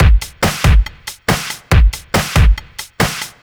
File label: Break Beats, 140 BPM; Loops 140 BPM; Vengeance - Essential Clubsounds Vol.4